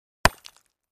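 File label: Cinder Block Hit Sledge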